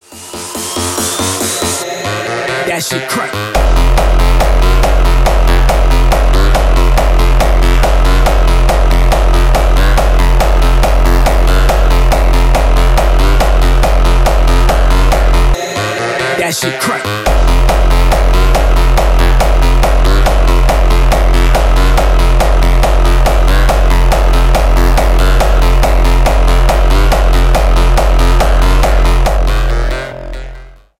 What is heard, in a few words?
hardstyle